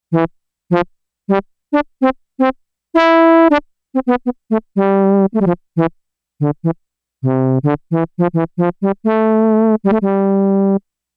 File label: Horn, Yamaha DX11; Factory Preset Voices